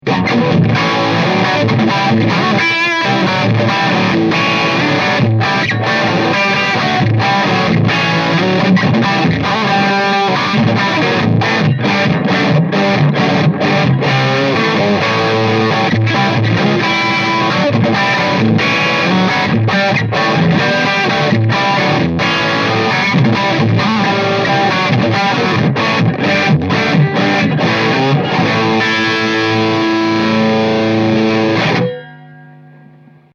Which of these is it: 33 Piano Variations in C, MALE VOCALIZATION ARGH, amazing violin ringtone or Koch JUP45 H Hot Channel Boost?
Koch JUP45 H Hot Channel Boost